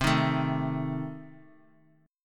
C Minor 6th, chord; Cm6 strummed; JGuitar

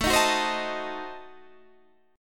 A Diminished 7th A, Guitar Standard; Adim7 strummed; JGuitar

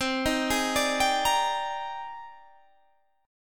Ab Major Sharp 11th C

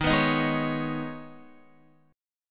Eb 7th Suspended 4th Sharp 5th, Guitar; Eb7sus4#5; strummed; JGuitar

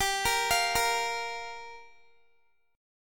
G Minor 7th, Guitar; Gm7; arpeggiated

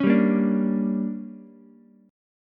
D Major 7th Suspended 4th Sharp 5th, Ukulele; DM7sus4#5; strummed; JGuitar

